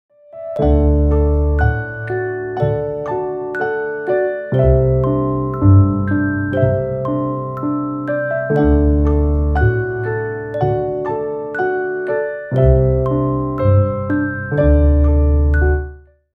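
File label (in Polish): biedronka podkład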